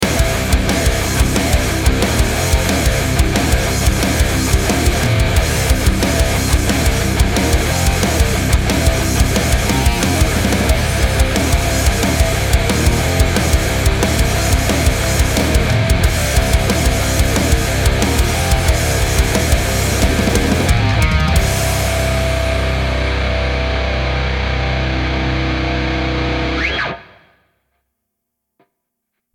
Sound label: sport metal 90 bpm short